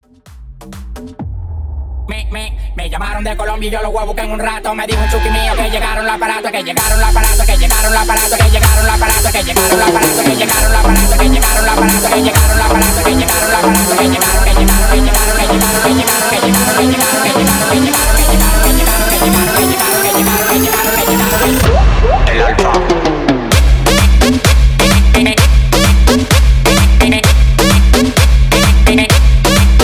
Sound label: Latin Electro Guaracha 129 BPM; Re-Edit